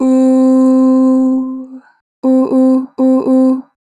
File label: Ooo, Harmor Vocals; 4 bar flat; Pitch Flat